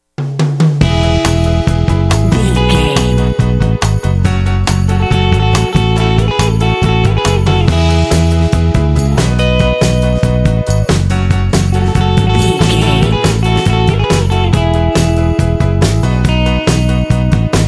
hawaiian beach breeze jingle

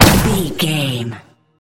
sci-fi weapon plasma pistol

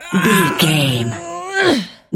voice female effort push

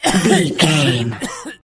voice male cough long

